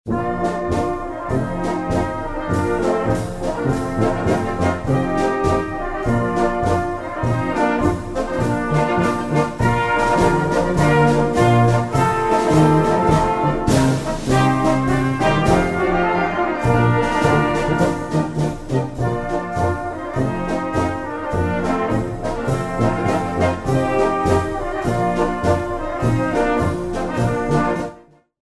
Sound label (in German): Musikverein Terezka Polka